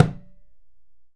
Tumba Slap, Protean Drums; Multisamples; Digital Sound Factory

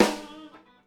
HIT; PlatinumPhattCD1; E-MU Producer Series Vol. 8 – Platinum Phatt; Grooves Filz Hitz

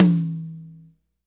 TOM, Percussion; MARKYDRUMS

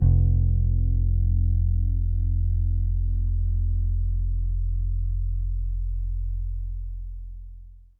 Bass Ending, 70s Analog Funk; Sonic Foundry (Sony Creative Software); What it Is!

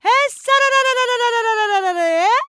VOICE, EASTERN FX; ILIO; Vocal Planet

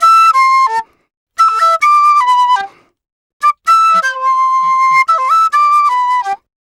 Penny 130bpm C, Penny Whistle; African; Quazibeat; African Secret

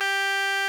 RMI EP; Sonic Reality - Vintage Timetraveler

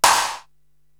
SD Noise, Korg TR-Rack drum machine

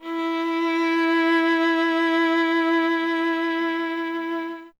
viola E; Miroslav Vitous; Solo Instruments VOL-1

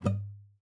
GUITAR FX; Xsample VOL-2

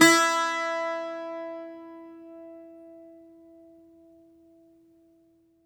Greek Bazouki, Plucked Strings

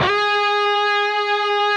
PRS A, Guitars, LEAD; Spectrasonics; Hans Zimmer Guitars VOL-1

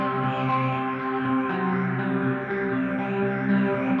Gretsch Ring FX 120 C, guitar drone; 120bpm

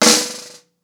Snare Trem, drums singles; The Roots Drumloops

